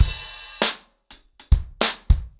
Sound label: 100BPM, ACOUSTIC DRUMS